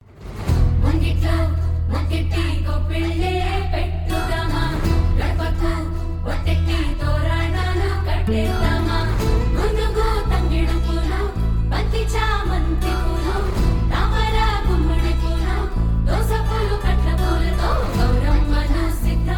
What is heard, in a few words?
Slowed Reverb